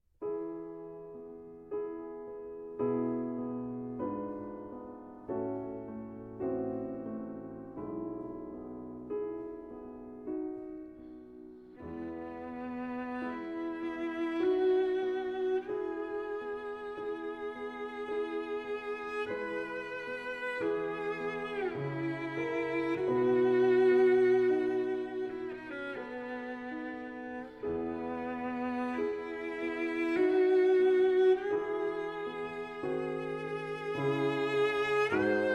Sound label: Arpeggione Sonata in A Minor, Adagio